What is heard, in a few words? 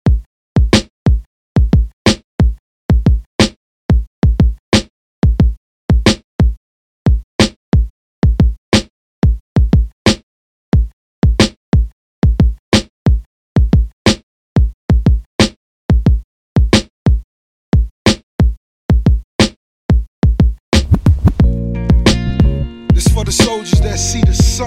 Funk Redrum, 90 Bpm